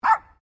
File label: Animal Dog Poodle Miniature Bark